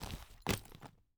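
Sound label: leather, metal, twist, contort, small, smooth, light metal, drop, slight distance, room ambience; Eiravaein Works; Quirboilly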